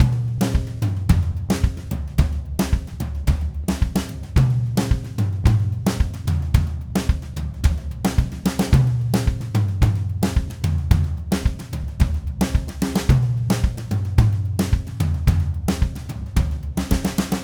Red Maple Groove 110, DW Red Maple Kit

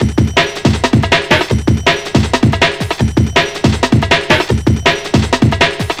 160, Drum Loops; Total Drum&Bass

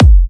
Kicks F; markus hakala

